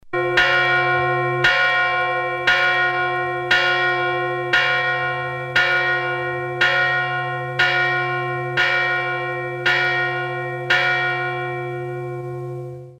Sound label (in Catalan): campana